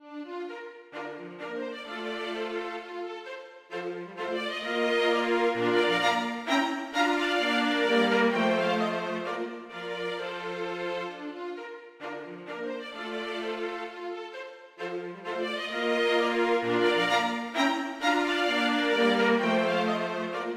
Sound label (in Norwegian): Menuet - for strykekvartett; Stochfleths nye 1760